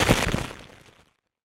debris; SoundsCrate

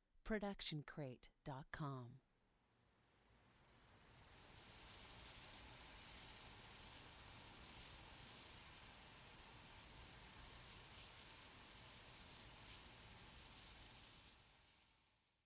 Magic Portal; SoundsCrate

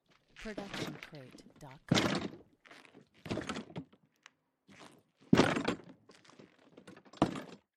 golf bag on ground in push cart mono; soundscrate

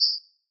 Bird Chirp; SoundsCrate

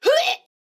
woman death grunt; soundscrate

